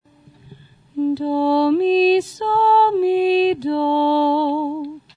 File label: major triad